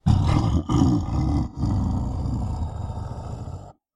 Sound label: kagouti death long; skywind